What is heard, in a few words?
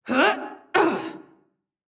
Grunt Jump, Mage; Seraphim; Realm Royale